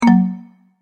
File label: multimedia tone end